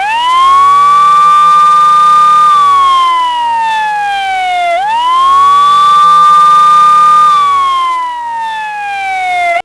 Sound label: Police Wail Siren Close; cars; Max Payne